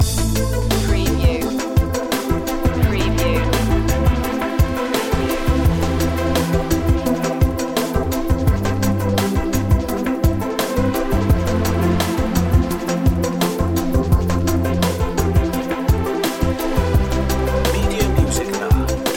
chill loop

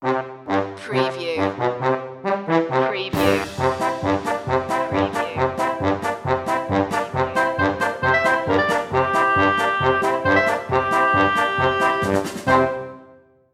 circus stinger

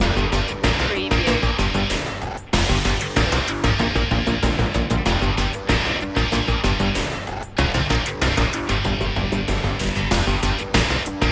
epic trailer sports loop

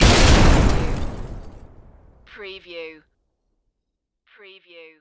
Explosion Auto; Sound Effects Bible

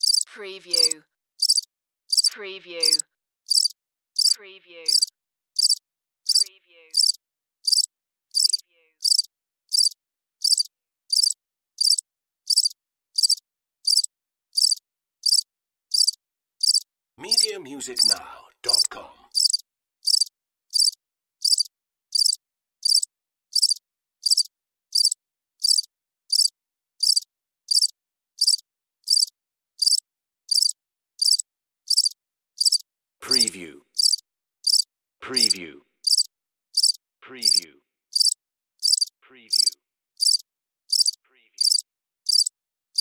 Cricket Single Chirp

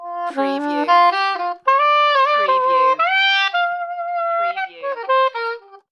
Sax Smooth Riff

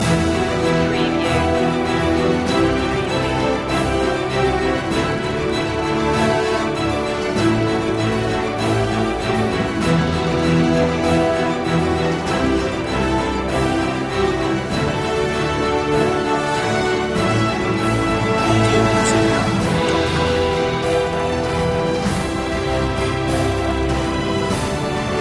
no choir

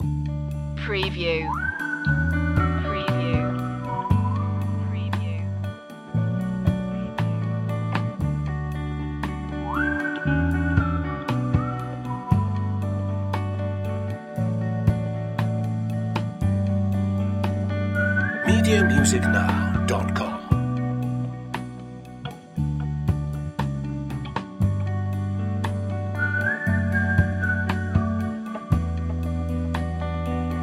simple thanks loop

